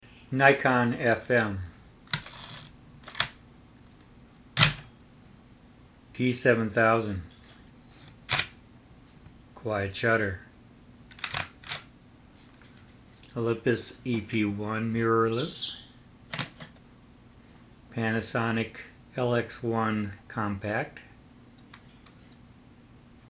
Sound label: Shutter sound comparison, D7000